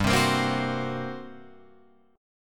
G-Minor Major 7th Double Flat 5th-G-down-Guitar-Open F; strummed; JGuitar